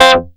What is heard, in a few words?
SHORT CHOR; Masterbits; Vintage Voltage